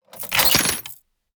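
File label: Equipment Tech Steampunk High Tech Gadget; David Dumais Audio; Steampunk Mech SFX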